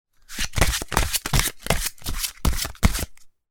カードシャッフル; board game casino mahjong

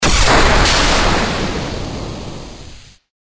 雷魔法 大; モノラル; 魔法効果音

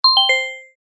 場面切り替え ベル 遅め

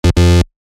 ブブー 不正解

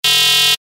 レーザー

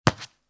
スリッパ 一歩; 足音の効果音

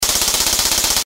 会話音 メッセージ タイプライター

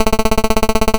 会話; nes retro talk sound effects

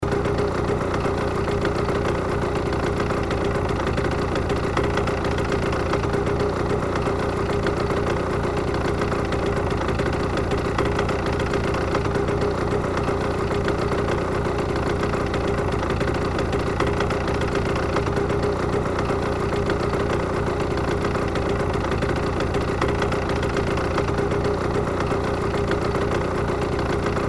原付のエンジン音; 環境音

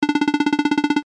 会話

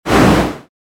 モンスターの羽ばたき 1回 モノラル

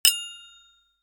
金属がぶつかる音・スプーン

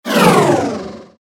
能力低下; モノラル

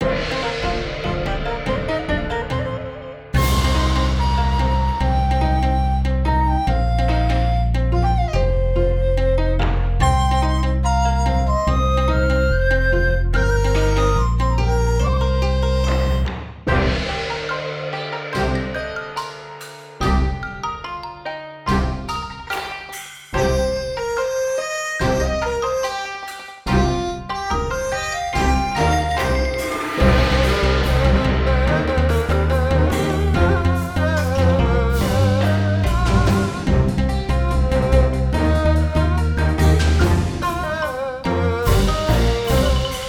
中国

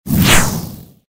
火矢の飛ぶ音 モノラル

